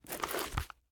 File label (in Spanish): COGER LIBRO